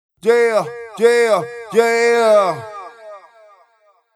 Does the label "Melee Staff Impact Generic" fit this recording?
No